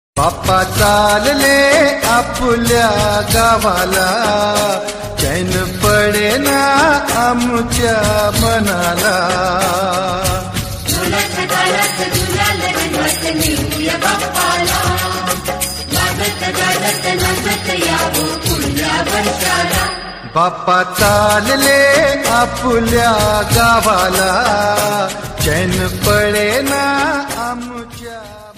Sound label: Marathi Song